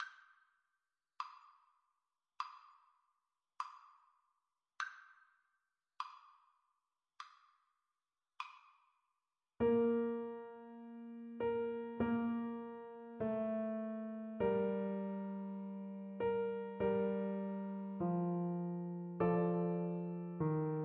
steal away, Flute

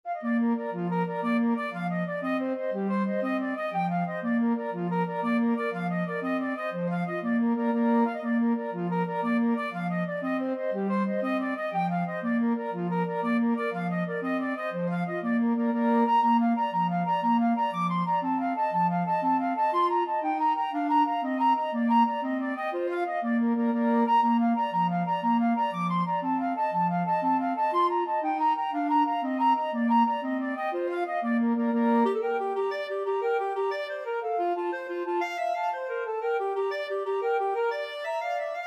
irish jig medley 2FL CL, 2 flutes clarinet

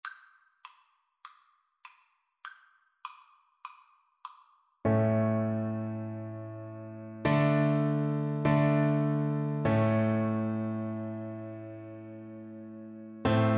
violin

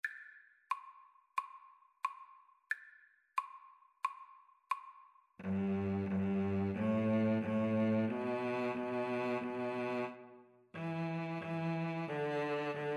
Cello Duet

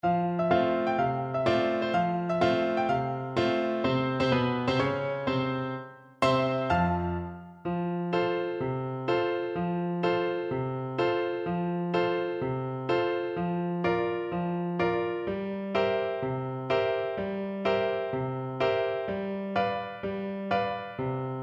FL kar, Flute

